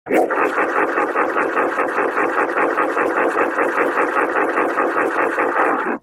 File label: little guy laugh scary version